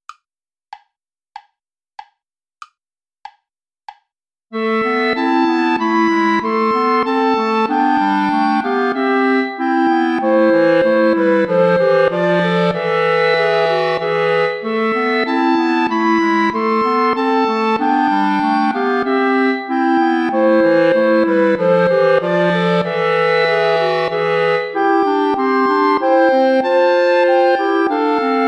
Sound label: play along without clarinet; bach johann sebastian; ich steh deiner krippen hier